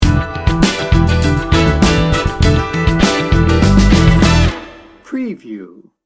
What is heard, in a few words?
funk factory sting bumper